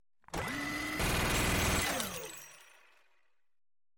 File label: minigun